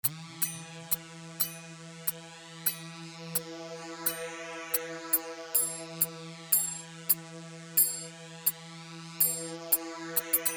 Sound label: Sagat; Sagat 1 صاجات